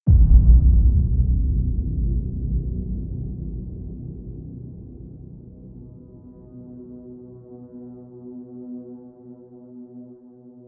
hybrid core music sound hunter of the dark epic modern hybrid horror music